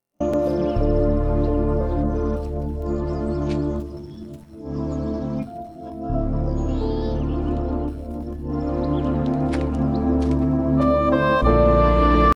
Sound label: sad no vocals